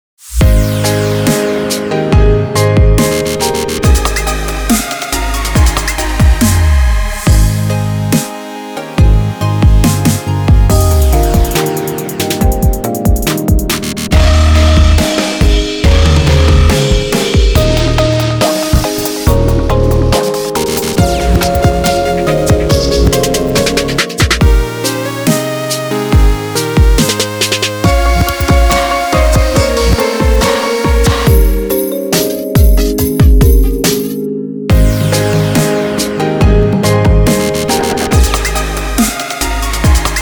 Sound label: DUBSTEP CHRISTMAS